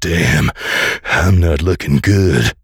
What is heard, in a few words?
Pain; Duke Nukem; Forever 2001